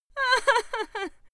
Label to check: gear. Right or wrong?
Wrong